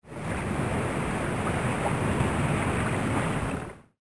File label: water